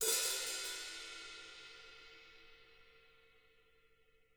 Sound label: hat, drum